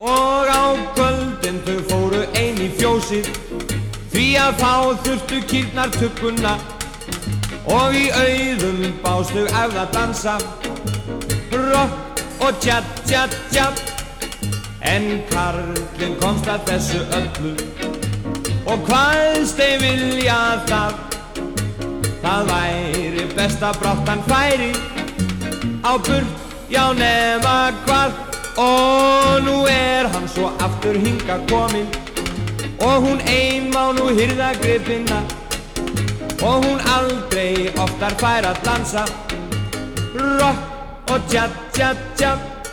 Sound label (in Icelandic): Rock og cha-cha-cha